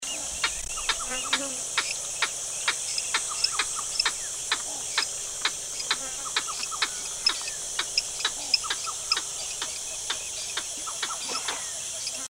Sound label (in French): Taleve d'Allen Porphyrio alleni, oiseaux de mayotte; oiseaux terrestres; faune de mayotte